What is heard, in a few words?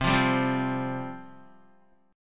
C Suspended 4th C, Guitar; strummed